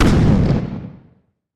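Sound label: single furious explosion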